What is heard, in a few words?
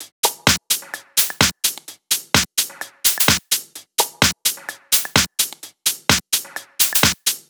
128BPM Drums, Tech House; Titano; Vengeance Samples; Bonus TechHouse Kits